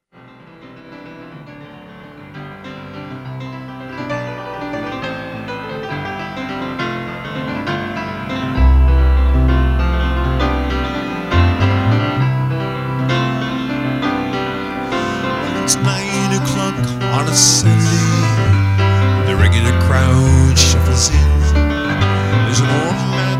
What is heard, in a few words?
Piano